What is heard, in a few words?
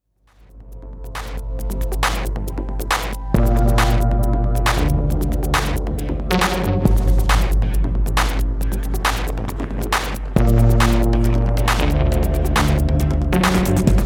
Slow Music